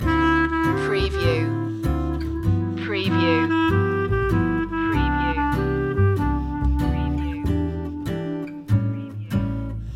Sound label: loop